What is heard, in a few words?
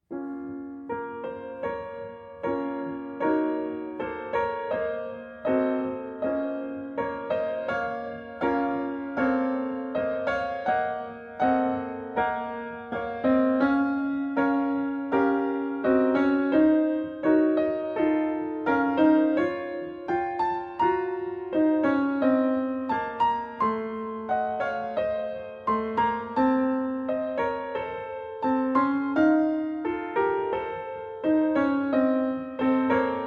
Prelude and Fugue in B-Flat Minor; The Well-Tempered Clavier, Book I, BWV; BWV 867 I.